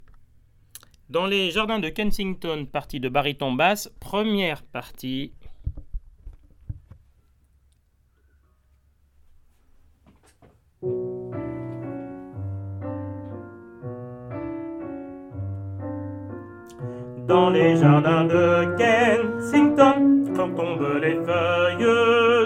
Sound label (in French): Bass